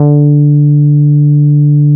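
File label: NORD P D4, bass; NORDY BS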